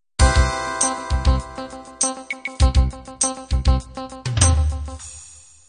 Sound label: slow ballad intro